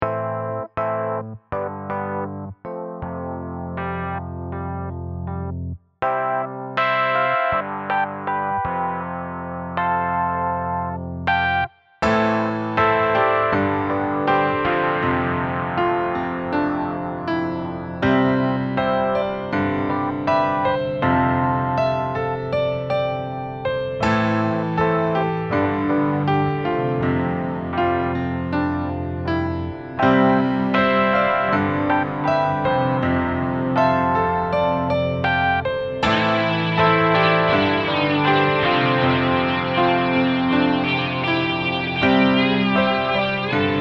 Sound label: Twin Pianos